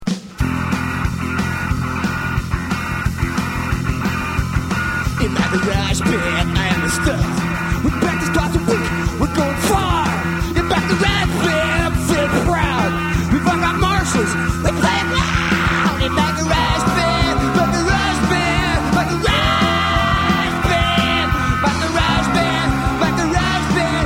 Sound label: Garage Band